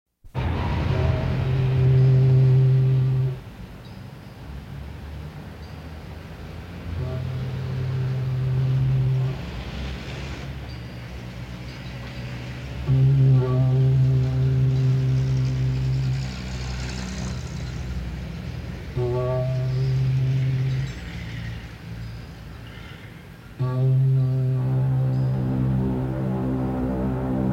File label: duet whistle and bamboo pipe